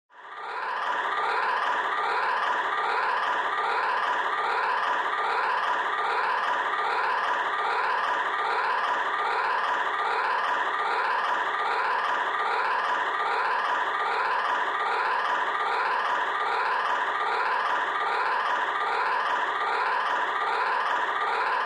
Alarm Collision

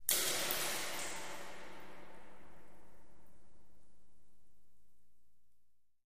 Echoey Cave Drips Wa

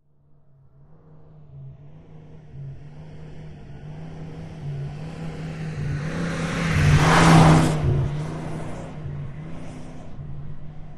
Dodge Ram: Fast By With Shaky Revs.

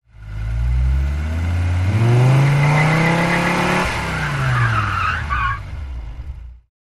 Accelerate Quickly, sports car

